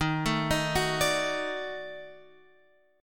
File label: D sharp Minor Major 7th Flat 5th